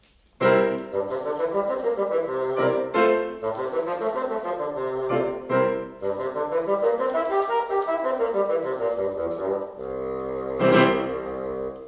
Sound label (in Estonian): Fagott klaveriga